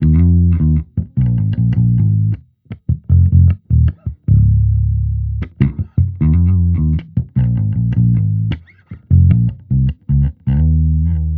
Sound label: Amped loop 155 Fm, Electric Bass Loops; Slate Digital BASSHOLE Sample Pack